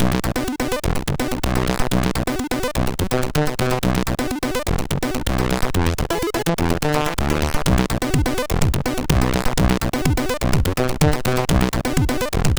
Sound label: Dead Funk, MOS6581R3